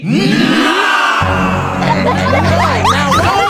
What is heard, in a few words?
collective nahhhhhhhhhhh